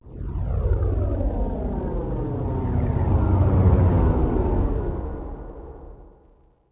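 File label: traffic by exterior; Star Wars Obi Wan; XBOX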